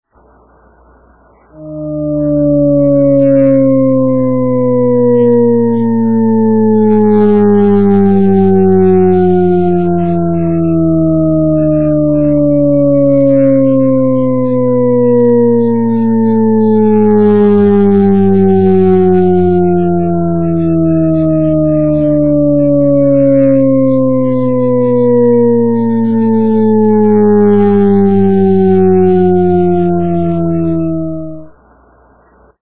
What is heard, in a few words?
decending, Auditory Illusions